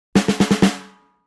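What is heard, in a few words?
Drums Snare March Accent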